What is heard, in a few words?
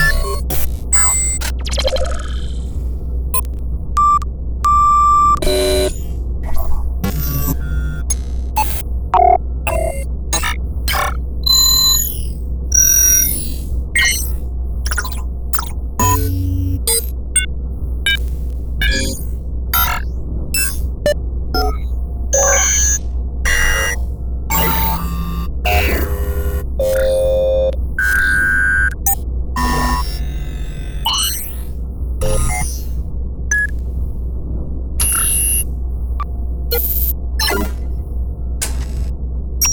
Interface Sound Effects Futuristic Beeps and Buttons; Bluezone